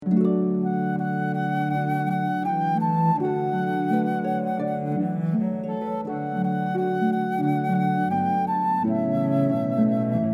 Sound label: Prayer of St Francis, harp flute cello